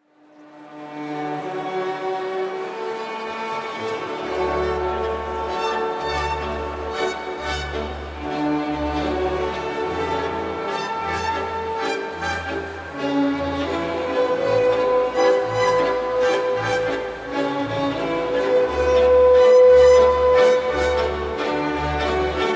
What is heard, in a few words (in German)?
Donauwalzer